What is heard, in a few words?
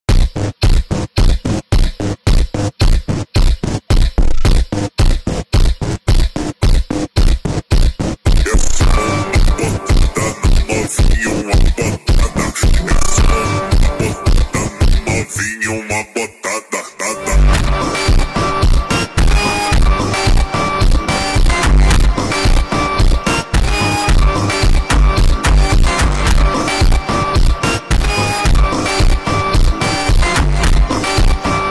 brazilian phonk